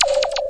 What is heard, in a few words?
zap verb, Electronic Hits